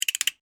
Steampunk Robot, Scifi, Steampunk, Robot, Mechanical, Spider; Science, Fiction; BlastWave